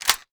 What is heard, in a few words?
sterling mag in